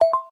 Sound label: Key Call; LG Smart Folder X100S